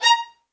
MED ATT A#, soft violins; Q Up Arts; Denny Jaeger Master Violin Library

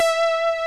LINEAR SYNTH

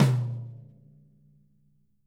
SD TOMS, Living Drums; Sampleheads; Peter Erskine

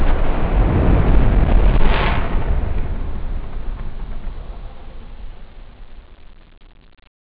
fire sfx; jazz jackrabbit 2

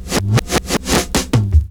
DRUM FILL; DRM FILL KIT; AKAI

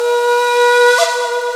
ouaaaah; East West - Global Sounds; USHUAIA

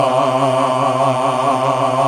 GOSP. AAAHH

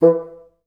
BNS2STAC E2, Advanced Orchestra; Best Service; Peter Siedlaczek's Advanced Orchestra

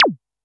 korg trident

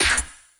PERC, Drum & Bass, 180 BPM; Ueberschall; Drum & Bass Flash Trackz

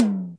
RY30 EL.TOM; Hollywood Edge; The Big Bad Beatmachine

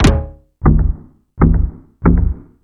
FX Sounds, Drum Machines

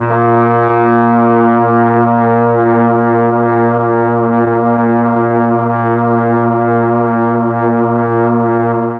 A#2 BRAS, Mellotron; HALFSP.BRASS; Mike Pinder - Mellotron Archive CD

